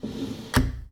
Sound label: Foosball Blocker Slide